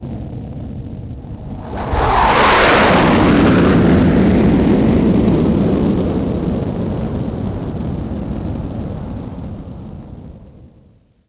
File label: Jet By; shadez